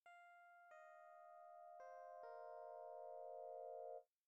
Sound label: Intro Pad R; SmallDungeon